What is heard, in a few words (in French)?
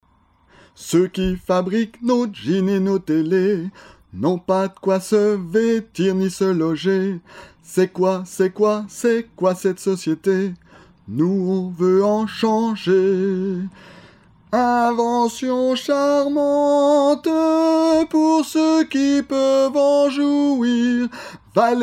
1 voix, chorale